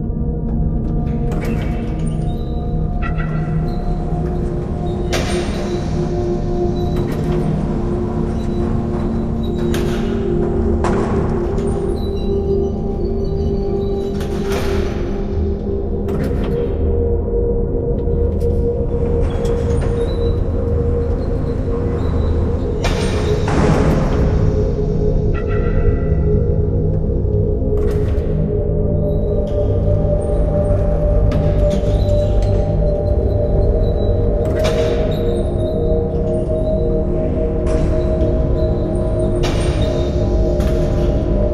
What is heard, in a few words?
rattler